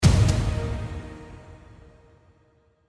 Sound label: Stab